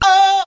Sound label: "oh" SUNG